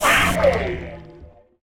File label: elder hit; minecraft; plaguewhale